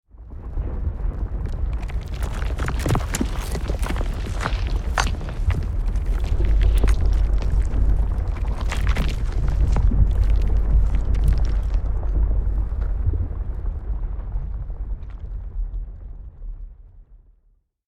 Volcano rumble deep powerful; nature

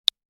Infographic button

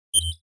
Futuristic retro notification

